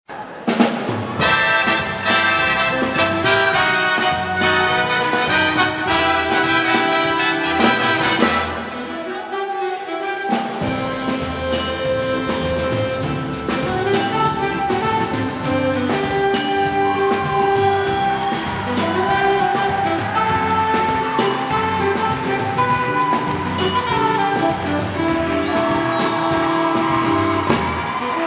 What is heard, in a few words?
samba, orchestra